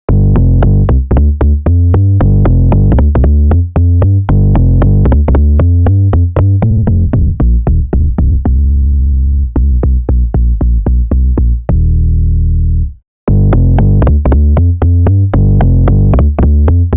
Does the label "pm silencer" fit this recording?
No